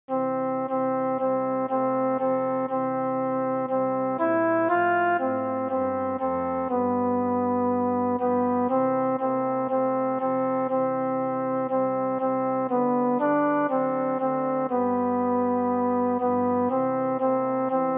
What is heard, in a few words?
alto